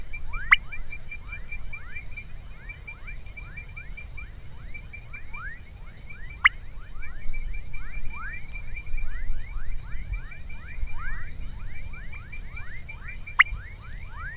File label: Leptodactylus sertanejo